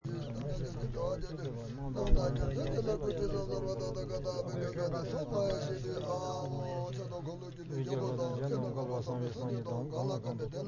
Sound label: monks bless the flags, nepal; As we left the village a group of monks sitting in a circle said some prayers over the flags